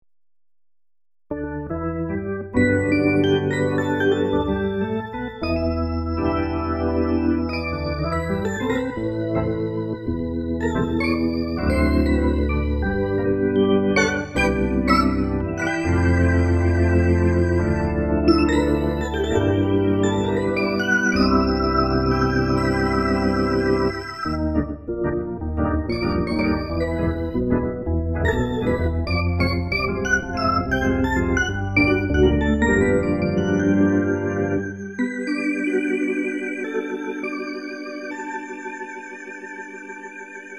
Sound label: dual organ; Kurz